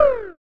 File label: monster hit